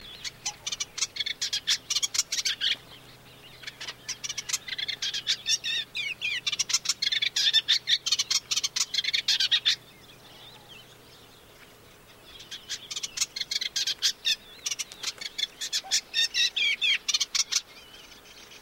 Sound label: Akmukallit Hippolais pallida Olivaceous Warbler